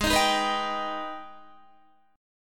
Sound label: Ab Minor Major 7th, down, Guitar; strummed; AbmM7